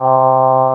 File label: Talk Box AH